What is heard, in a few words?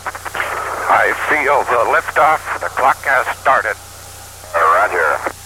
Aurora 7 Liftoff